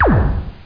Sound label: button